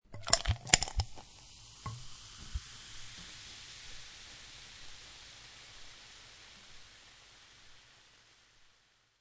Pour Soda Water Fizz Of Carbonated Drink Into Glass With Ice Cubes